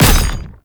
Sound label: Projectile Impact; Ultimate Abilities; Madurai; Warframe; Focus